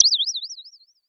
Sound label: Sound of a bird tweeting nicely